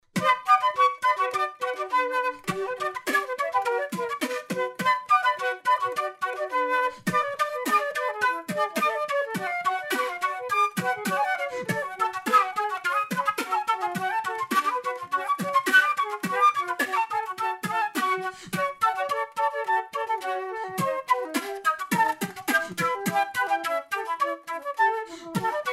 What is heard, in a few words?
badinerie duo flute; flauta beatbox; duet; J.S.BACH